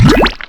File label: oil drop